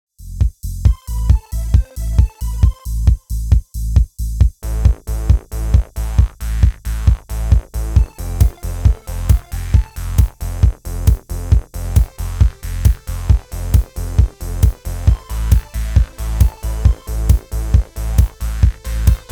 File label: thunderstorm remake with SID EMU